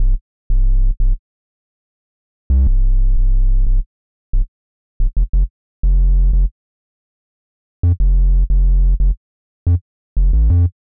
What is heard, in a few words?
bass